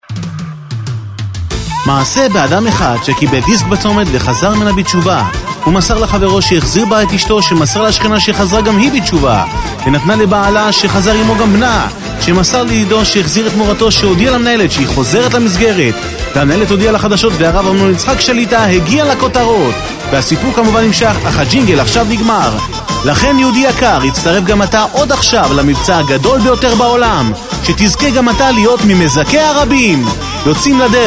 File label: Jingle; Discs Pack